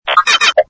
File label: droidspeak